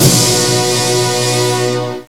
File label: super hit; Rubber Chicken Software Sample Bank